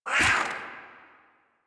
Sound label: Catbrow Combat Vocal Attack Short; Warframe